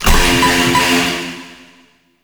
Vaults Vault Alarm On Off; Gameplay; Warframe; Entrati

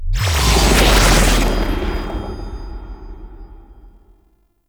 Interrogation End; Dax

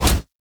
Scan Impact Pre; Frumentarius

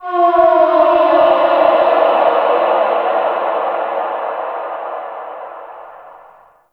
Landscape Ambient Texture

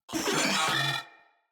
Moa Vocal Enemy Spotted

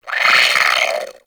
Sentient Brachiolyst Pain; Warframe

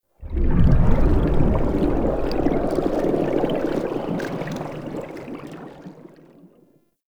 Bubble Outro; Warframe; Tau; Cinematics; LastBreath

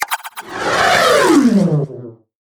Animal Conservation Drone Spawn Whoosh

